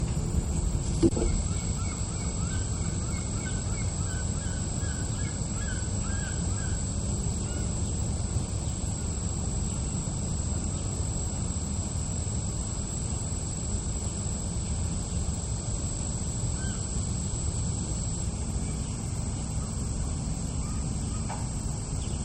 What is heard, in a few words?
Seagull Sounds, Bird Sounds